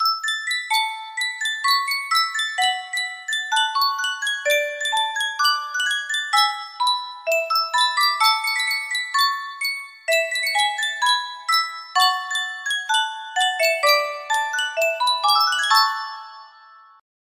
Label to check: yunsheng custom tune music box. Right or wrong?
Right